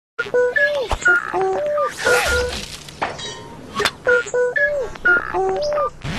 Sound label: the owl music